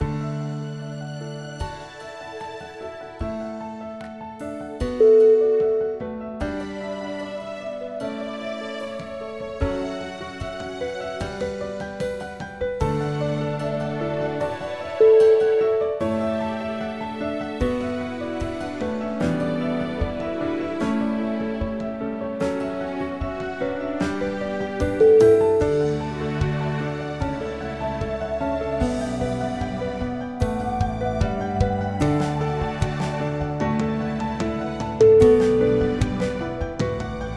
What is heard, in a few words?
piano arioso